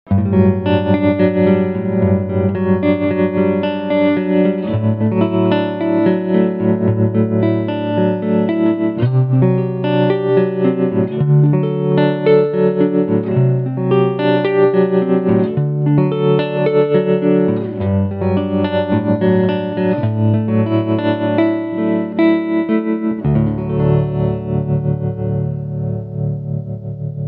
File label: pattern trem, mobius